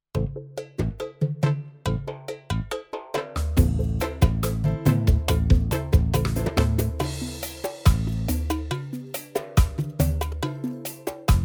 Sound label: Yamaha PSR-A5000 Style Rumba Studio